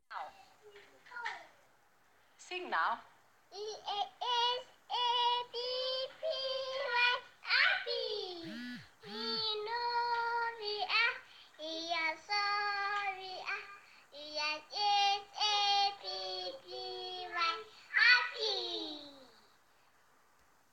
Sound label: happy